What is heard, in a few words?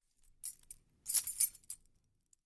pull out